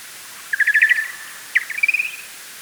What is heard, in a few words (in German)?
Rauhaut Soziallaute